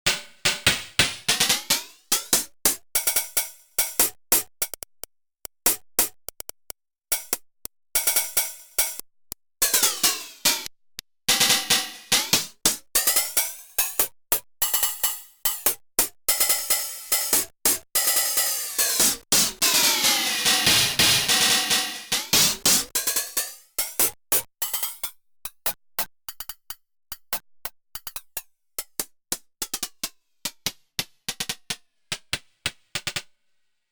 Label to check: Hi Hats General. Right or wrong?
Right